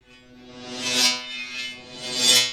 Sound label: Electric Shock